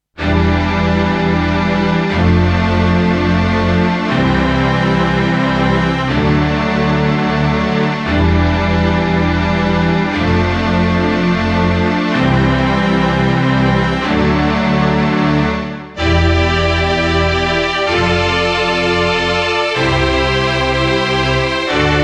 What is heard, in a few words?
Emax Arco Strings